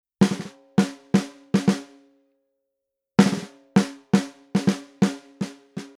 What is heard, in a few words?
sm 57 snare; Soyuz the Launcher